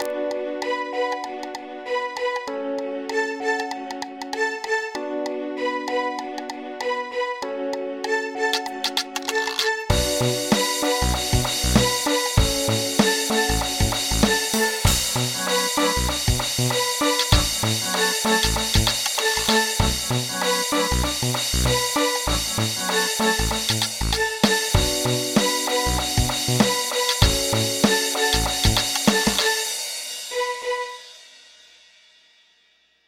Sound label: String Ensemble